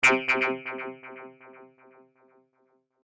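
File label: Digital Distortion Chime Click Stereo Delay; Digital Clicks, Blips & Alerts; Digital Sound Effects; Lynne Music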